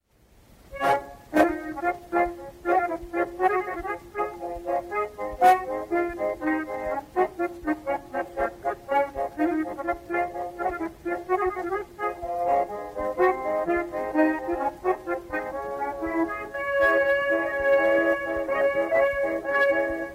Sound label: classic klezmer accordion; Mishka Ziganoff; Nokh a Bisl